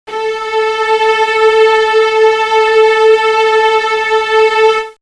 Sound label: violin string 2 A4